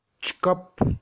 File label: Bird